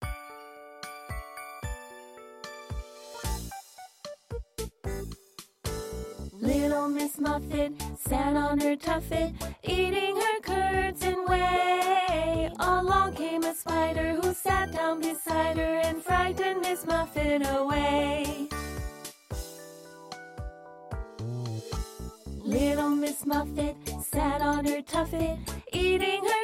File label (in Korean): 영어 여 노래; little miss muffet